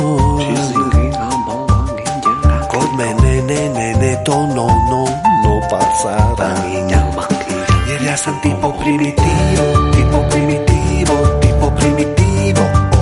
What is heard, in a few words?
Piano